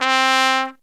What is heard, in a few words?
T C C, TRUMPET FX; Zero-G; Phantom Horns